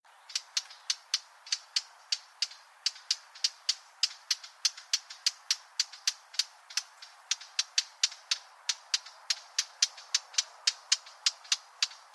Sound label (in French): fauvette tete noire appel